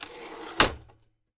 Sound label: TYPING, TYPEWRITER